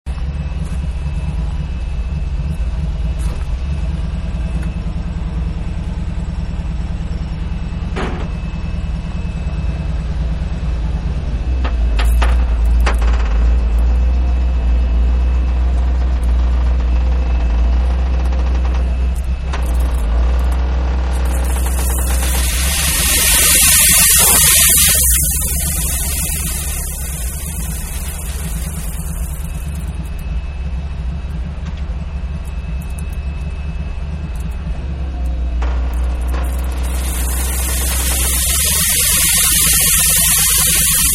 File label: Dump Trucking Gravel; Dump Truck-Dumping Gravel; Trucks; Vehicles; Manhattan Production Music